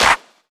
Claps & Snares

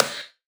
Snare, Dubstep One-Shot Drums

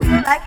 E VOX STAB; funky; Loopmasters; Funky Underground Vol 2

